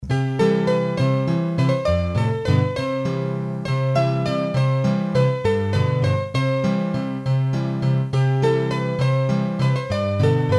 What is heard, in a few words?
hurdy-gurdy, Valses; Rosalie de Bon Matin